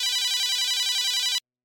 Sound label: flip phone ringtone